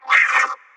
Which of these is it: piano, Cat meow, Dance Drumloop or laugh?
Cat meow